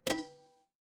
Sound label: feedback achievement